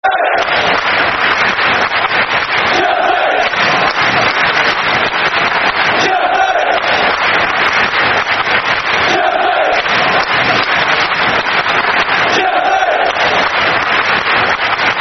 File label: Chelsea (Clapping) Football Chants; Soccer Song